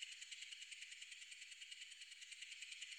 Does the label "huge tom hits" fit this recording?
No